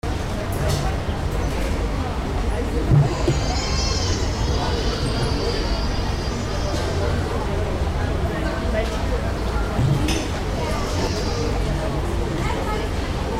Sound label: cafe; germany berlin cafe am neuen see